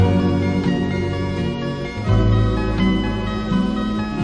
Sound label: 113 oldtimey arpeggio; SAMPLED MUSIC LOOPS